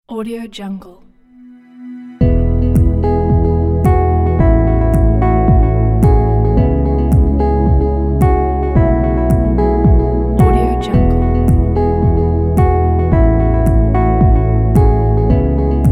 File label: ambient motivation background